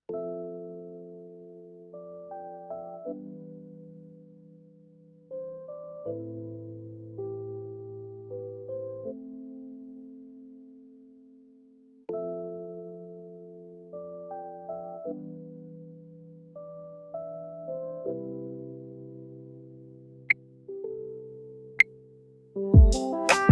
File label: focus lofi